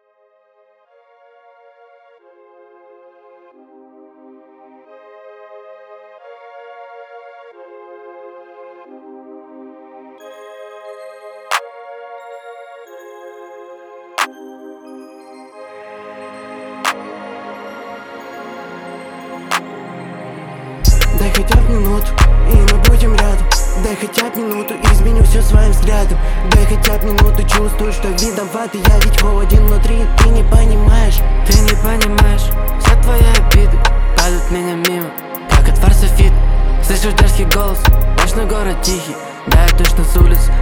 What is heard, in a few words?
TRAP